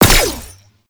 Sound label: gunbrella bullet deflect; Koumei